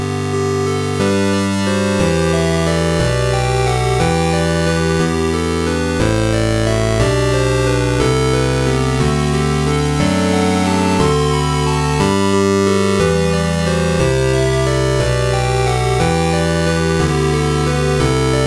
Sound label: square lead